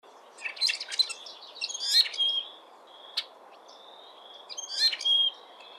BLACKBIRD RUSTY; species/birds; wbba

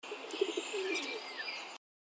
DOVE ROCK; species/birds; wbba

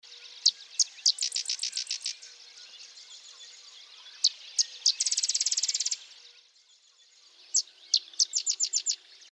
WREN SEDGE, birds; species/birds; wbba

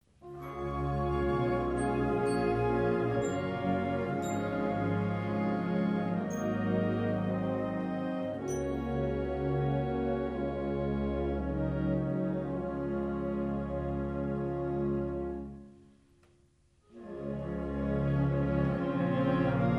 alma mater, pep band

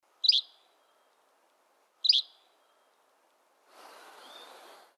FLYCATCHER YELLOW BELLIED; species/birds; wbba

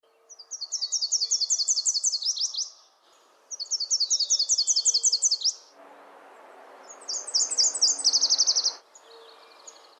WARBLER NASHVILLE, birds; species/birds; wbba

